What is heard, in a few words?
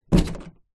household door open hit plug wall protector; zapsplat